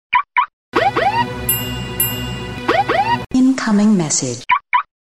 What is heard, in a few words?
car lock